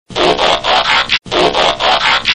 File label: Transform